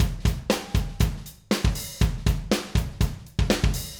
Acoustic Live Drum

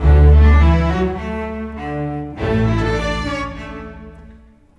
Bass, Cello, Viola, Orchestral, Rock Pop; Sonic Foundry (Sony Creative Software); Orchestral Series VOL-4 - Rock and Pop

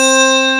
DIGI

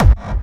hard bass drumz

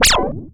MEMORYMOOG

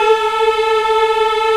African Mm; Roland LCDP11 Africa VOL-1

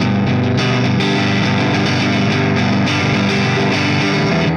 Clinical Distorted Funk Guitar

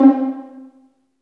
Vlns Pizz; Roland L-CD702; Vls Pizz

